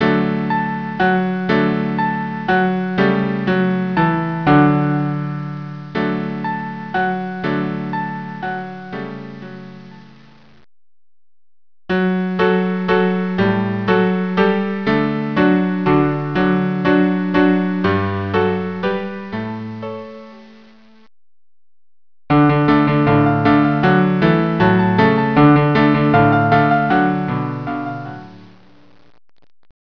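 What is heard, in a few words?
cello piano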